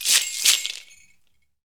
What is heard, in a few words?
SHAKER, Afro Shakers, Ankle Pods; Roland LCDP14 Africa VOL-2